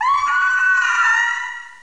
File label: Ice Queen Die